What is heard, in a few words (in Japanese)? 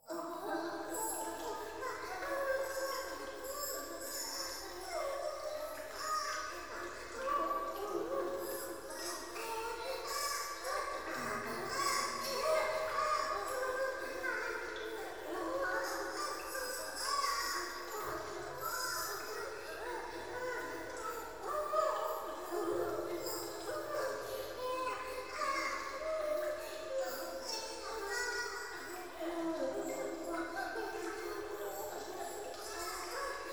風呂 低音喘ぎ 雨上がり